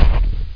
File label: Hit; Quake; hknight